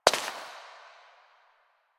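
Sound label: Step Rock, footsteps; Warframe; Koumei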